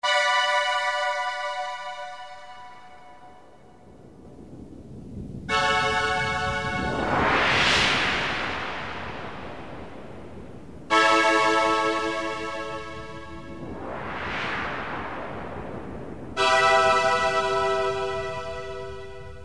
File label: ringtone